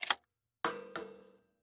Weapon Bullet Shell 12 Gauge Eject Shells On Metal Surface; AdobeCC